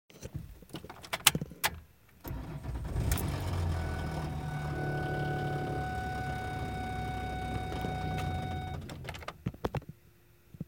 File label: valve cover knock, sounds like rat fan blades; 4.0l OHV SOHC V6; valve cover knock when got home now sounds like rat fan blades